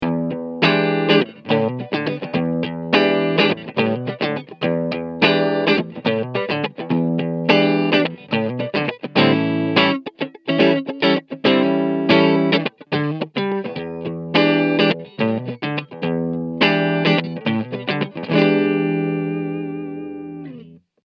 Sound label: Bogner Clean Captor X 2 Mikros front